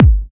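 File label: BD Trancy, bass drum; Takebeat; Club; VEC1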